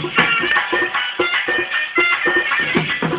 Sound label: Messagetone